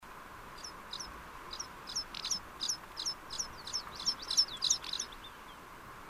Antpet, Anthus petrosus; Luotokirvinen / Rock Pipit; 14.5.2005 Porvoo, Söderskär; lyhyt laulusäe maasta / Short song strophe from ground; Finland; Alalaji / subspecies littoralis

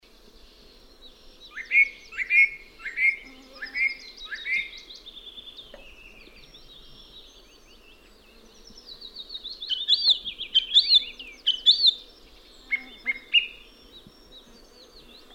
Turdus, Rastaat / Trushes; Turdus philomelos; 23 June 2006 Bolshe Betju, near Inta, Komi, Russia; Linnut

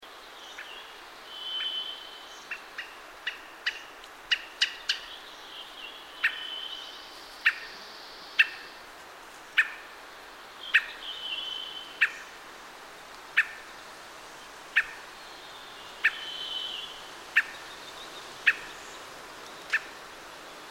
Turruf, rastaat; Turdus atrogularis; Mustakaularastas / Black-throated Trush; 21 June 2004, Podcherye, Yugid Va, Komi, Russia; Linnut; Redwing (Turdus iliacus)

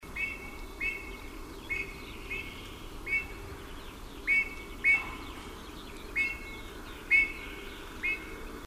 Bullfinch (Pyrrhula pyrrhula) calls, Syktyvkar; Linnut

